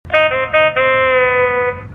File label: Cisco Saxophone